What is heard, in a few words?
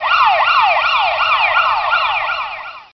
Police Siren, cheesy lo-fi